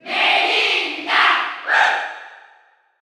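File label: Cheer German, crowd; Isabelle; SSBU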